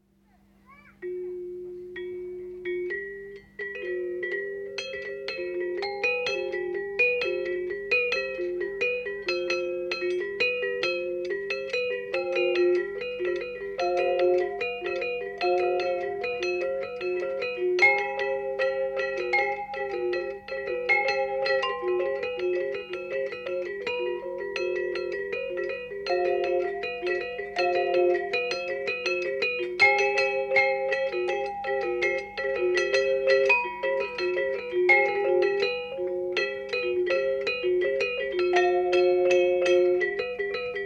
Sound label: selunding Rejang; Asak; Turanti